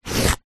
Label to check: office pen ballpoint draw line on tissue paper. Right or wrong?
Right